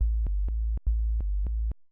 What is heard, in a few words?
SNTH BASS HOUSE 125 A; eJay